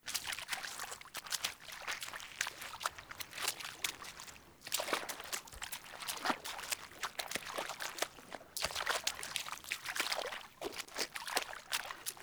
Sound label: Wolf Steps Run Loop Water; Animals